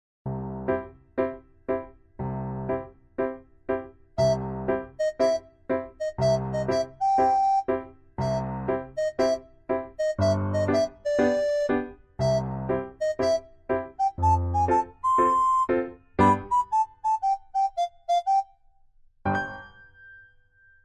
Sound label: music; dino